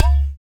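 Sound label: percussion udu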